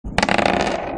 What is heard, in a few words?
Dice; Yahtzee